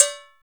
LATNCOW3 OP, COWBELLS

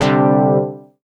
micro triad, synth

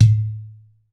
UDU TONE, African Perc; E-MU Formula 4000 Series Vol. 4; Earth Tones

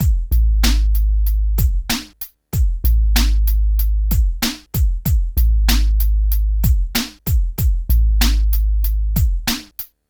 PHATT BEA, Hip Hop

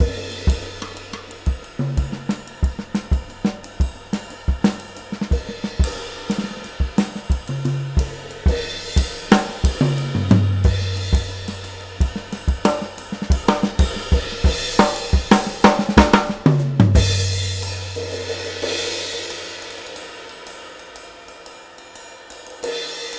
JAZZY 90 STRAIGHT 8THS, DRUMS LOOPS; BASEMENT DRUMS VOL 2

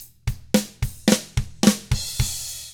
POP ROCK, Double Platinum Drums; 109POP ROCK

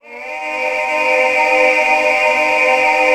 male oohs, vox; E-MU Formula 4000 Series Vol. 1 - Hip Hop Nation; Vox FX

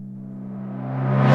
REV Timpani, Roland JV-2080

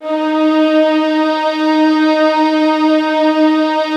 D#4, soft violins; Q Up Arts; Denny Jaeger Master Violin Library

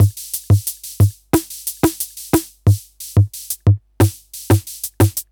retro drum machine Loops 90bpm; Copicat; MT40

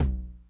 Tom Low Low Tune Max Decay, 909

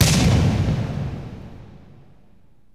BANGS; AMG Megabass REMIX! VOL-2

